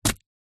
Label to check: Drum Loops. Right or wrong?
Wrong